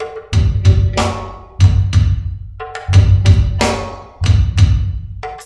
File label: DRUMLOOP, Ragga & Dub Reggae; USB Soundscan vol.27; DRUMLOOPS